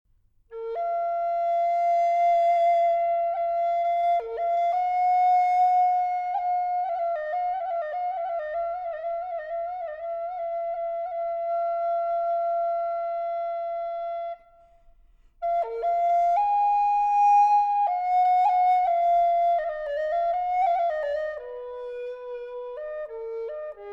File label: Improvisation